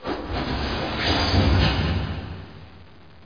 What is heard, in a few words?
amb machine